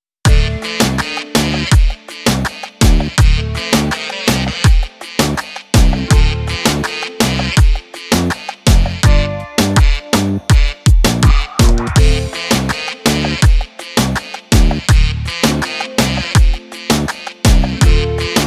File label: Balkan instrumental